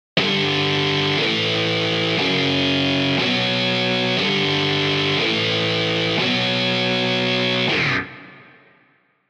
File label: guitar rig all